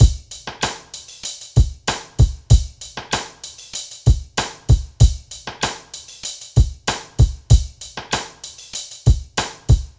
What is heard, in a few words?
Drums, Hip Hop